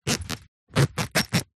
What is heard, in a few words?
tent zipper; zapsplat